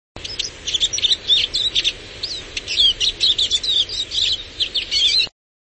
Lucarino Carduelis spinus

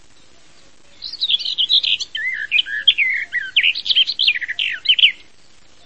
Beccafico Sylvia borin

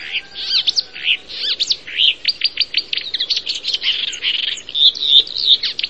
Cannaiola Verdognola Acrocephalus palustris

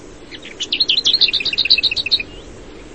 Bigarella Sylvia curruca

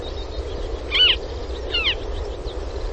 Falco di Palude Circus aeruginosus

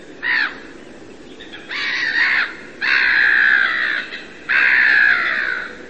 Gabbiano Comune Larus ridibundus

